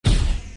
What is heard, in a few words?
hit; pugong